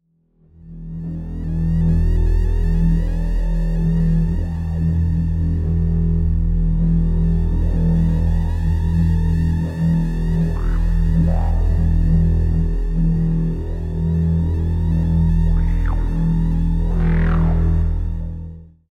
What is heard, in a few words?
creature